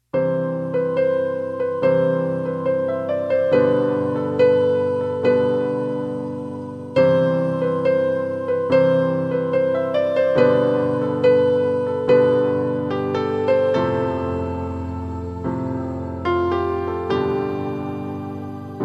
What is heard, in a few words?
Tone Instr.; Tamil Ringtones